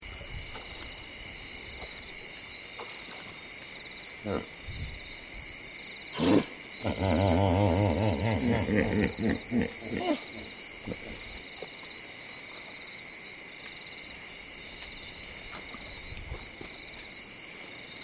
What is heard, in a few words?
Night Hippo Walk